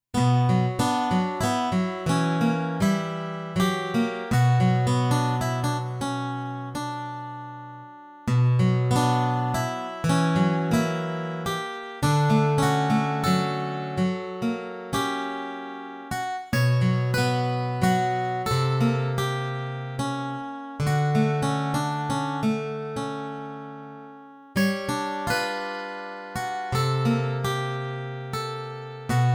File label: New Possibilities (Guitars)